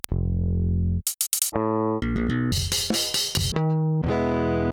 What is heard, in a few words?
Saturator; Virtual Analog